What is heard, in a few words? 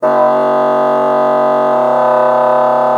MULTI, bassoon; Xsample VOL-6